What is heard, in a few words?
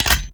Cock Start; Weapons; Heavy Shotgun; Corinth Prime; Warframe; Tenno